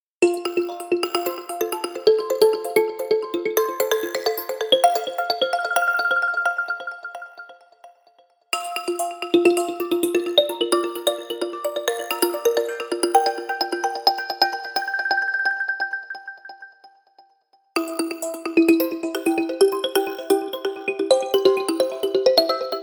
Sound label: Jingle; Infinix Hot 50 5G; alarms